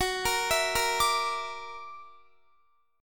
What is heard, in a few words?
F sharp Augmented 7th F sharp Guitar; F#+7 arpeggiated